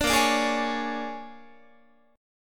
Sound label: A sharp 7th Sharp 9th A sharp down Guitar; A#7#9; strummed